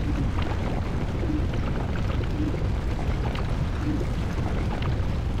volcano, ambience

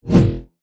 Beam Knife, Weapon

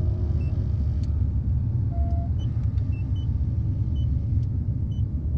Wave Base Station, ambience

